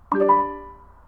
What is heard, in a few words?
Cast tone